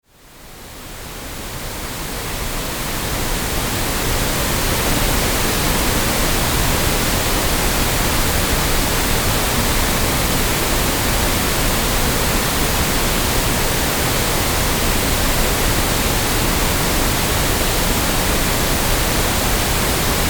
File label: pink noise